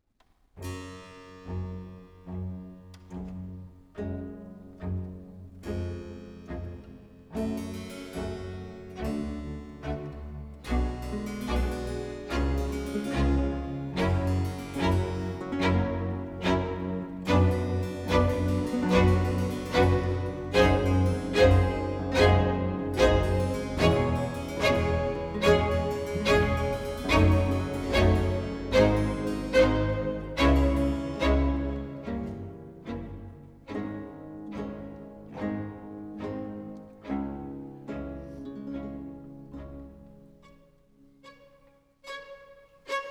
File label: Concerto for 2 Violins, Cello, Strings and BC in G minor, Adagio e spiccato; Antonio Vivaldi